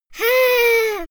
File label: little girl laugh